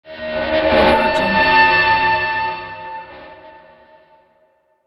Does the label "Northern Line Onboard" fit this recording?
No